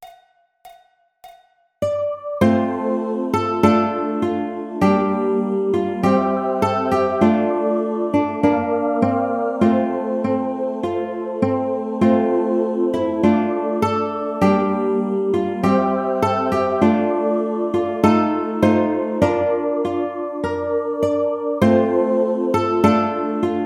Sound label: Auld Lang Syne Ukulele